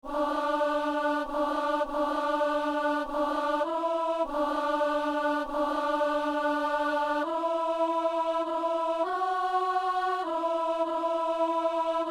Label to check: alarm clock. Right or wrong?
Wrong